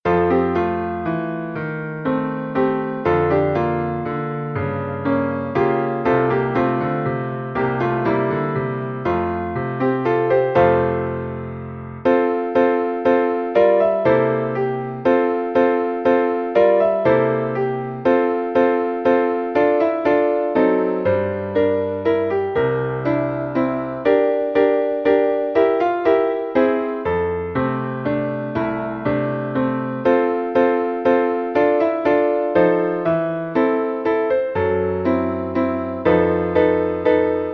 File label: instrumental